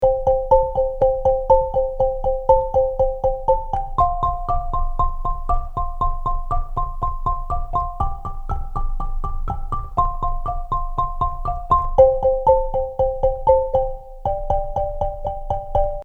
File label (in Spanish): Guitarra